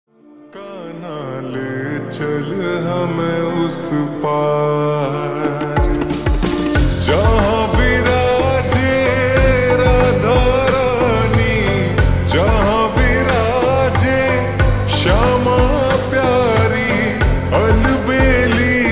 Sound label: Slowed Reverb Ringtone